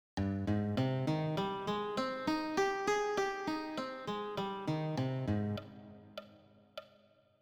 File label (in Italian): arpeggi; Esercizio 3